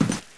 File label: player tile